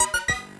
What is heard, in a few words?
ring